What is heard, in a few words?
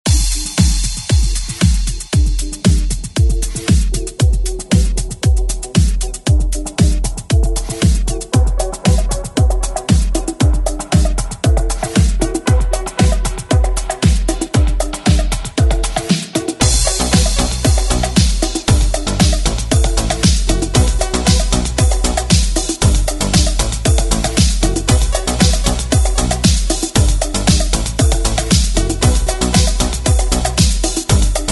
80s club rework; DJ Mhark